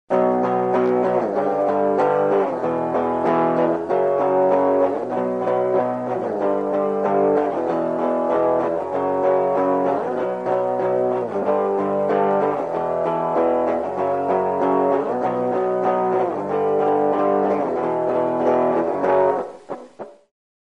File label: distortion wet